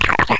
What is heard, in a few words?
GOBLIN; mordor